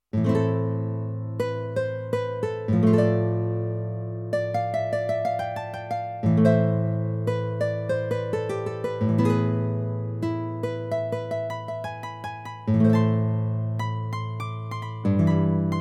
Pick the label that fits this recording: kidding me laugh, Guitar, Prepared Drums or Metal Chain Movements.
Guitar